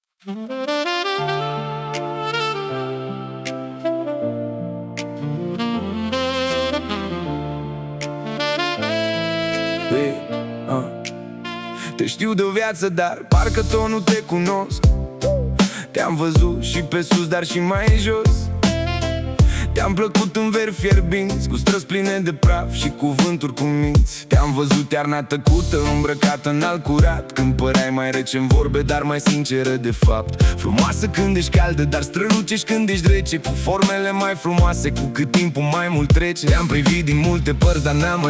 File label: Rap